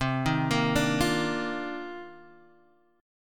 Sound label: C Minor 11th C Guitar Drop D